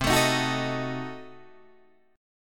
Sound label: C Diminished 7th down Guitar Open D